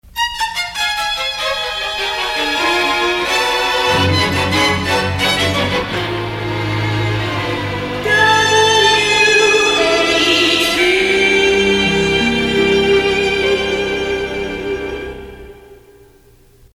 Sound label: Waltz Signature; WHB